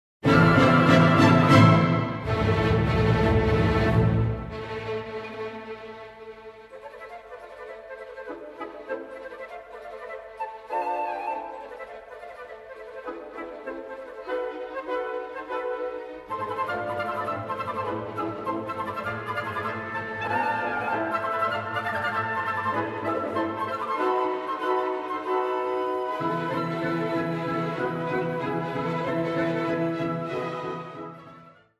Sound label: Mendelssohn Tarantella from Italian SYM